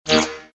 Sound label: short ambient noise humm